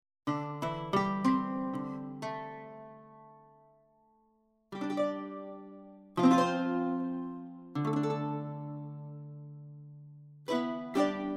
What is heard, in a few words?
Medieval Lute